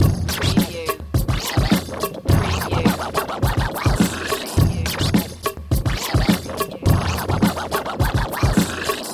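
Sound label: Scratch Groove 105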